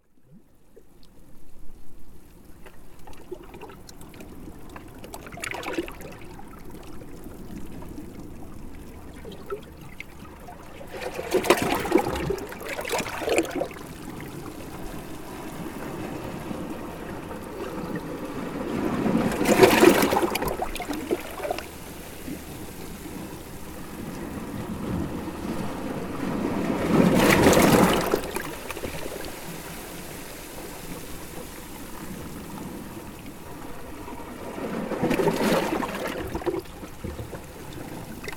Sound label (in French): Mer Galets à Tromoy